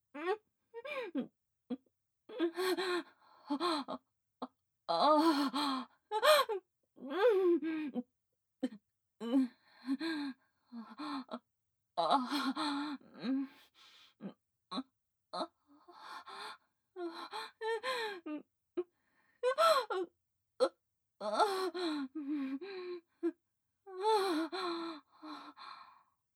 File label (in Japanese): レズ喘ぎ声, ASMR